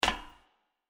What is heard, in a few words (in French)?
Footstep passerelle metalique; Blind Survivor